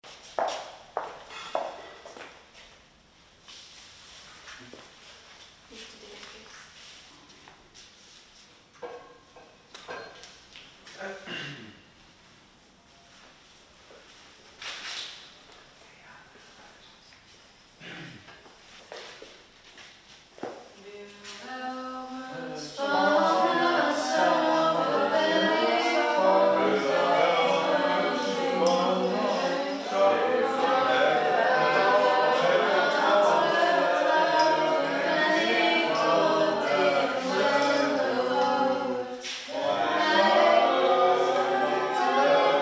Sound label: wilhelmus